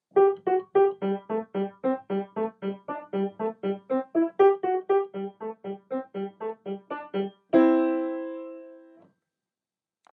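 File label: Hop hop hop, Piano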